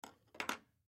Scissors Set Down, Scissors Put Away Cupboard Set Down; Household; Blastwave